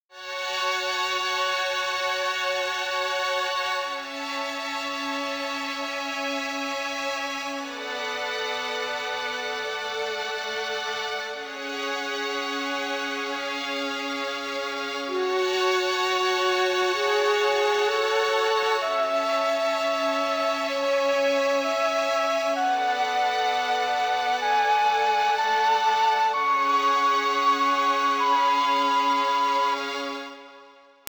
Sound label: intro mellotron